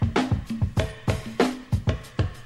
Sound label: breakloop